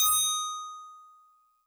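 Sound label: Tubular Bells DX100 D sharp 1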